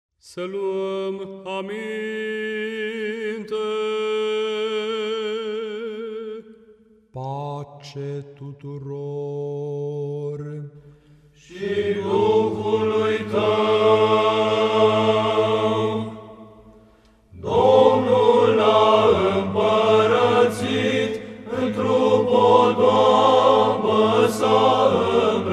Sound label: Prochimen glas V tetrafon; Stavropoleos